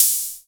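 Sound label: hi-hat open; AKAI S6000 CD-ROM - Volume 1; DRUM RYTHM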